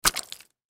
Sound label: Impact Mud Hit Moist Surface; Low Impacts; Sound Effects:Impacts; Blastwave